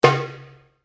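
Drum Darabuka Slap fortissimo, Egyptian Darabuka Drum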